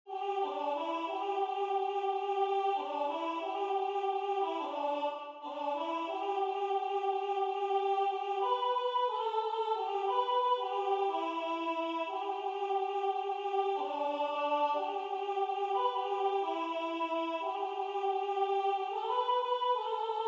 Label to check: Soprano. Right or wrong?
Right